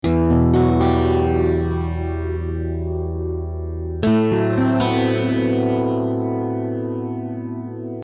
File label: 12-String arpeggios